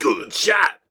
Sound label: punkcroc pain